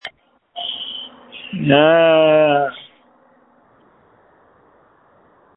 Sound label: Scream